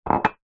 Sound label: mug cup ceramic set down kitchen worktop; household